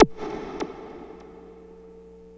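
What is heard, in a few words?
wall moog, bass; Spectrasonic Distorted Reality 1; WALL MOOG BS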